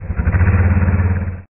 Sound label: motor start; Grave Drive